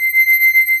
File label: CRYSTAL WAVE; AKAI S6000 CD-ROM - Volume 1; SAMPLE WAVE MIXING 1; 128 PRO SYN